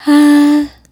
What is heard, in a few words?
"Hah" D3, Swingin' Single Notes